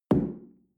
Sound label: shell place on tablecloth medium; soundpool